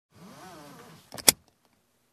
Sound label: Buckling Up